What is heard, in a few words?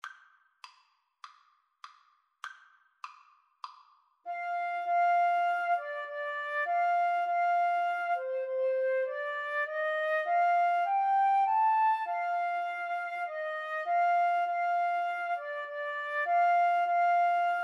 beautiful for spacious, Flute-Clarinet Duet